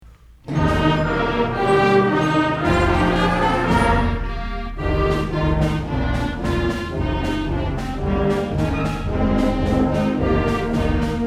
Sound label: Concert March; SC2010Spitfire